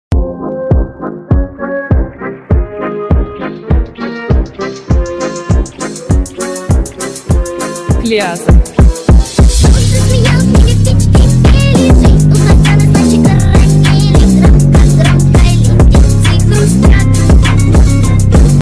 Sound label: bass